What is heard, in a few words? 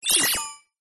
science fiction computer glitch tone